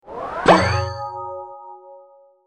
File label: open